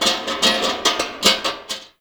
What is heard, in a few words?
Metal Rattle; Warframe; Tau; Ambience